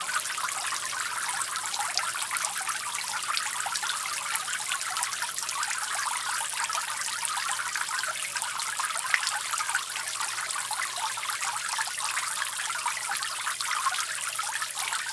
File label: Fountain Active Bubbles